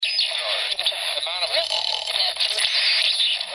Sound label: snore